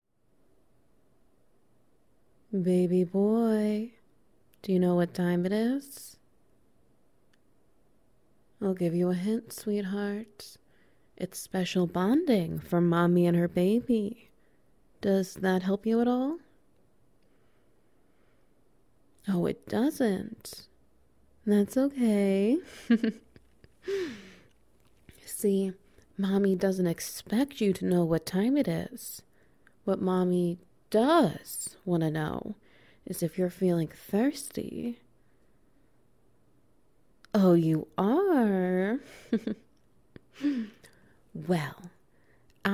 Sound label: Wet Noises; Your Mouth is Better then a Pump